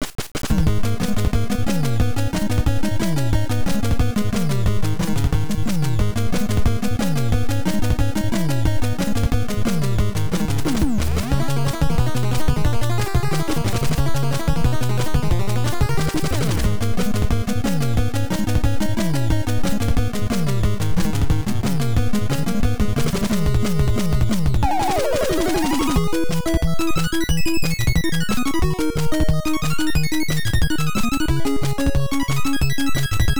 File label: Stage Warp; Gyruss; NES